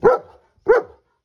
bark; loki

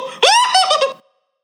oooohoho

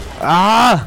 ahhh

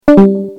discord leave but louder

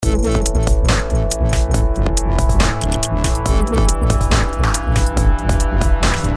dubstep boom

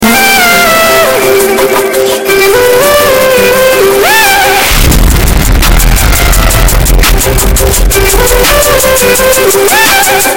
bass boosted

